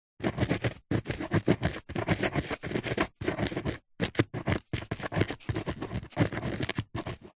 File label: office pen biro ball point write on cardboard piece